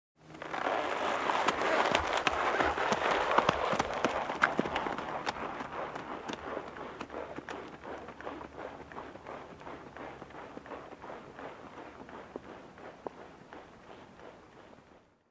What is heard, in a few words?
Horse Gait with Saddle sound